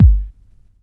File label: long tail reverb kick g key